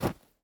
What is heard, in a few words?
snow land; teardown; ps5 windows xbox series xs